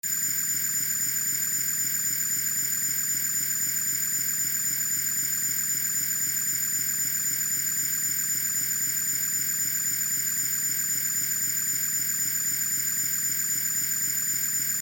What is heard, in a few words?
high pitch